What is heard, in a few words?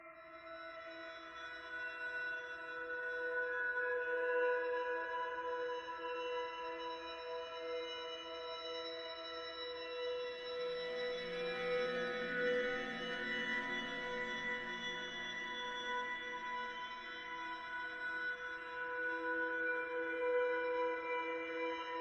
String; Assassin's Creed Unity